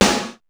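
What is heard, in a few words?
trashy snare; sr16 sd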